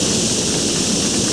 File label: Sand Fx; Playable Tutorial Level; TR1